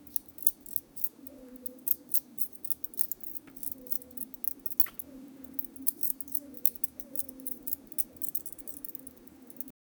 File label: soft bangles clinking as; adjustment